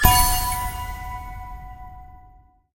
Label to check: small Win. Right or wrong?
Right